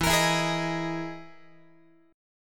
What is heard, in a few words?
E-Major Sharp 11th E, Guitar; EM#11 strummed